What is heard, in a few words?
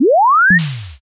warp jump